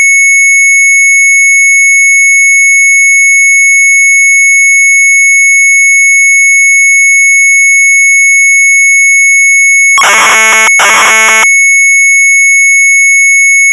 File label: MZ800 Tape, sharp; BREAKOUT; retromelsarchive